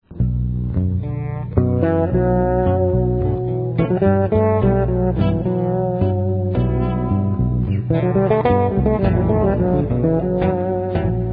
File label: Solo-Bass live